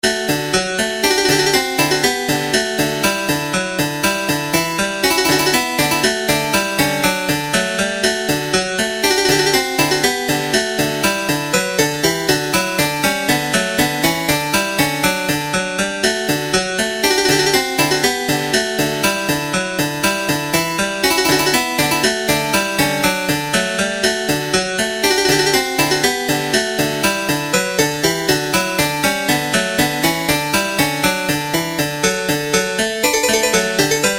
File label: english suite no 6 in d minor bwv 811 gavotte ii; johann sebastian bach; syntheway retromagix